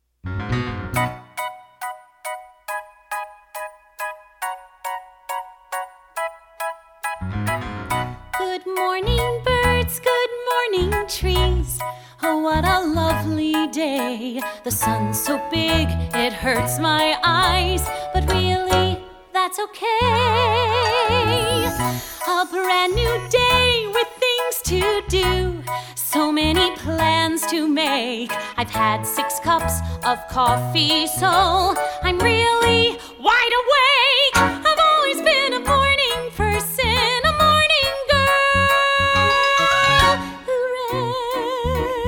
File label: High Register (Sopranos Mezzos); Iceberg lounge